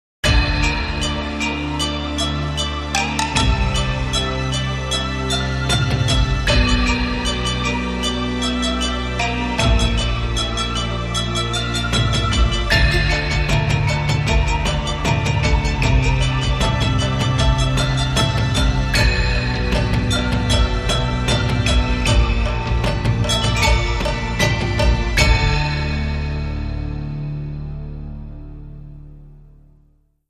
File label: Japanese Drums